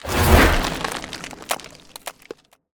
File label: Ice Spell Whoosh, Cold Whooshes; Arctic Aura; Gale